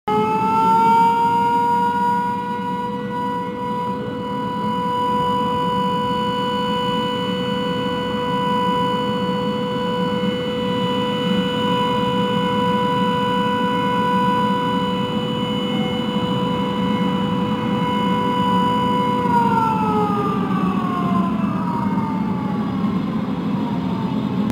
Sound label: newcastle siren; mysterious siren sounds across newcastle cbd; mysterious siren sounds across newcastle cbd leaves residents puzzled